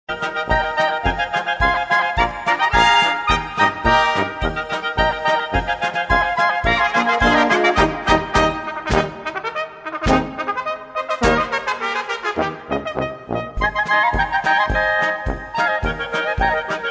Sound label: polka; bezdekovska